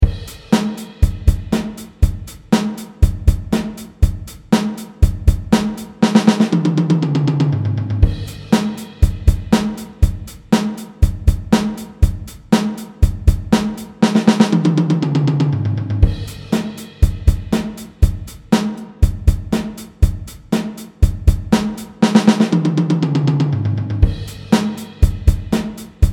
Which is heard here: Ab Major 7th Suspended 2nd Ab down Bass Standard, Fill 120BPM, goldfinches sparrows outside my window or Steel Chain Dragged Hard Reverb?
Fill 120BPM